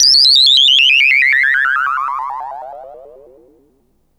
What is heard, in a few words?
System Sweeps; Sonic Foundry (Sony Creative Software); Underground UK House & Electro